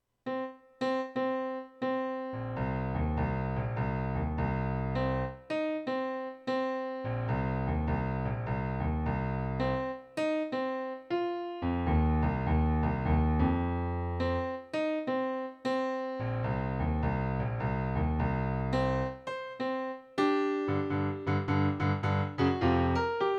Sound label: Blues